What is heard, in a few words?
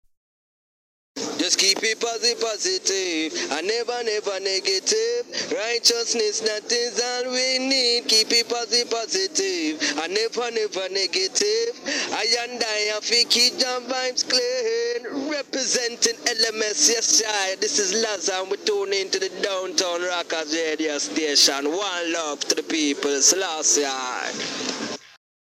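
Jingle, reggae; Jingol Positive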